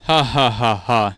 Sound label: hahaha